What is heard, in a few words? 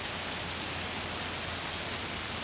rain; Soultree